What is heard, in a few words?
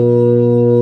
B3 C 1, ORGAN; VOCAL ORGAN; ORGAN #2